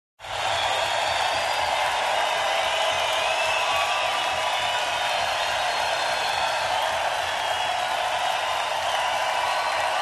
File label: Crowd cheering and clapping